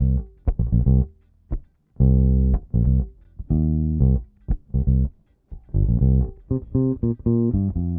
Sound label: Bass, 70s Analog Funk; Sonic Foundry (Sony Creative Software); What it Is! 70s Analog Funk